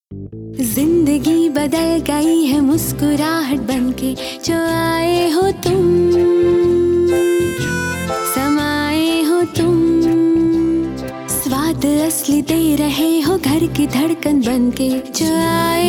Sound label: jingle oil